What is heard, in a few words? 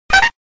toot